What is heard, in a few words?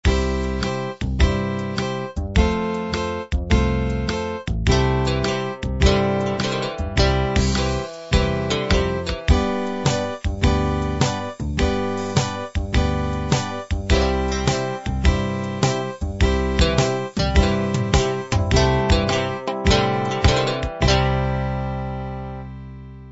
licks